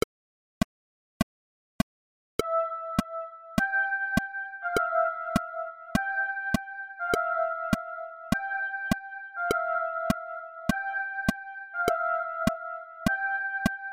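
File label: sub melody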